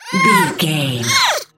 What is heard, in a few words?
goblin fairy effort push